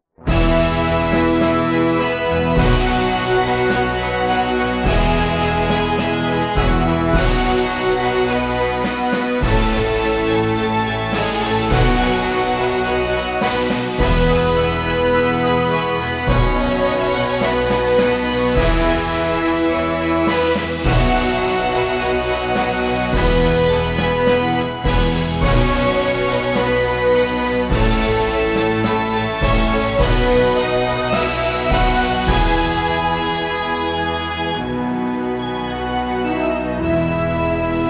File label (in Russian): Фанфары instrumental; выход Ивана и Василисы